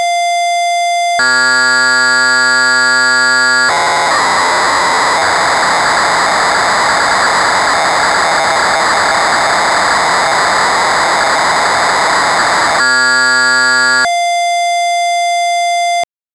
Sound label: POLY 800; Korg; Patches and patch dumps